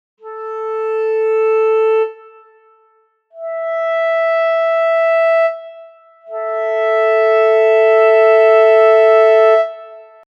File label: Fifth A 440 E 660